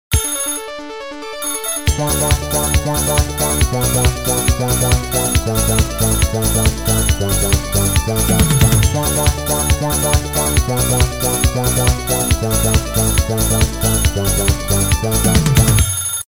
nokia destiny

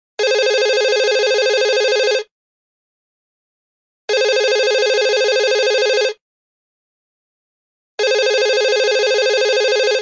Electronic 4x Nokia

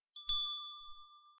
mini bell

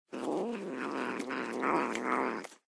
Cat Grunted Sounds

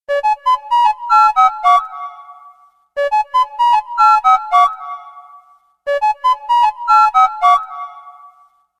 Huawei ascend p1

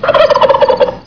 turkey gobble

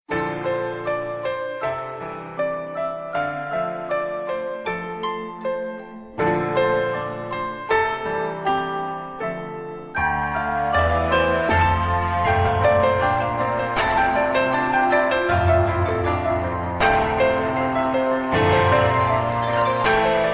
huawei p20

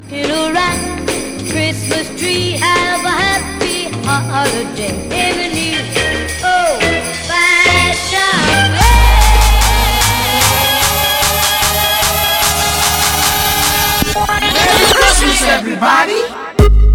rockin xmas beat

